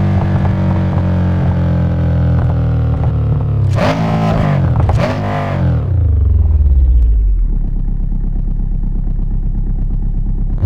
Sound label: v8 throttle off fast loop; vehicles; gmod